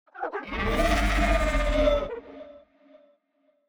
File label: Creachur Screech